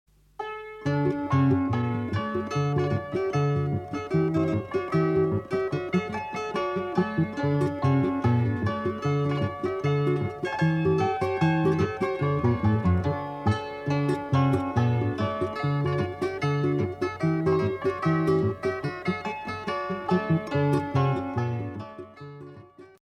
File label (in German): polka; schloss